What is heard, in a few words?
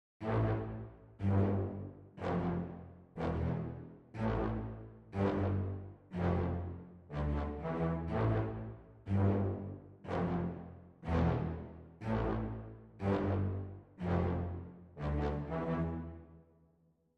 Brass